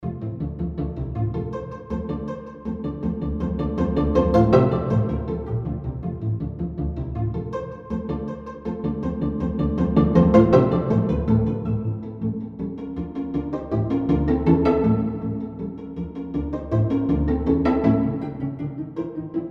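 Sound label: Strings Pizzicato; Prague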